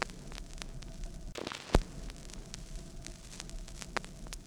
Vinyl, noise

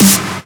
SN Big; VEC5 Multi Loops

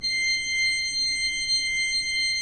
pipe organ, 3-D Audio

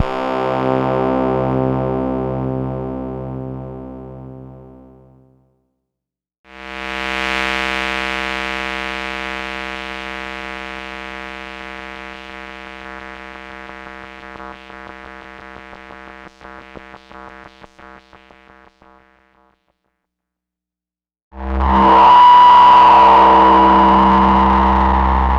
Korg MS-20 Pads

